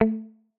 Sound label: pluck, 戳破泡泡